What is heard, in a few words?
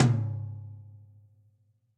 Tom Mid Classic Muffle; Drums; Drum Hits; Ableton; Session Drums Studio; MPE